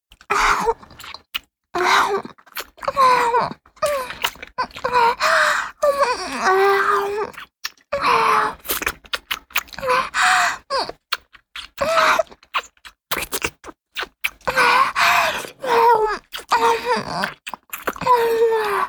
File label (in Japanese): フェラチオ(中):亀頭を舐める, チュパ音; チュパ音抜粋集